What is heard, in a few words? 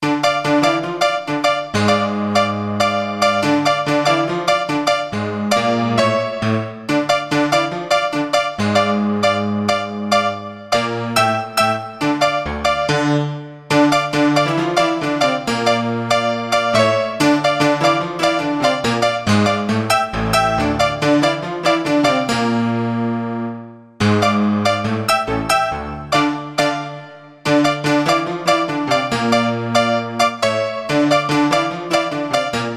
slapstick menace; criminal robber antihero villain jeopardy crime dastardly Honky tonk piano